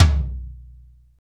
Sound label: ACU TOM LOW, drum, tom